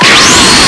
shield; valve; gohan

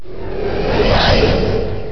teleport; valve; blackhawk

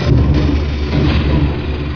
boomer; valve; coil

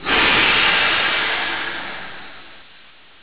stop; valve

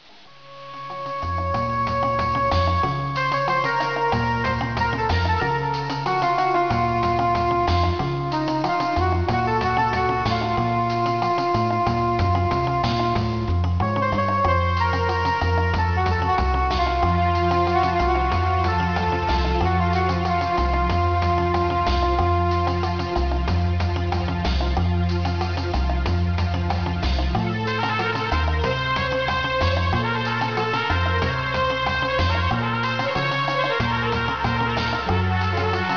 persia music; valve; dutch; partybar

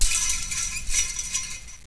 fence; valve; coil

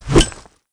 grenade throw, weapons

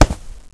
concrete; valve

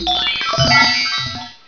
chime; valve

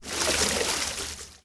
swim; valve

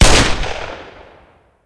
357 shot, weapons; valve

